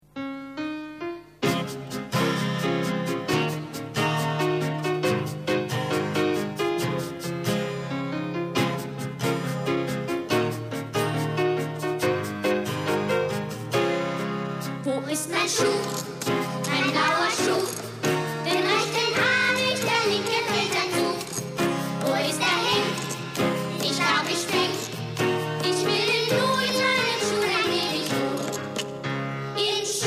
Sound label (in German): schuh